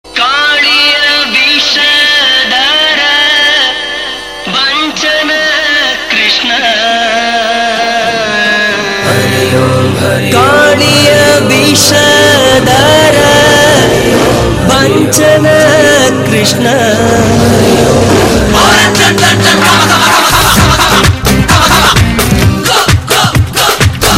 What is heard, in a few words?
Theme Song; Tiger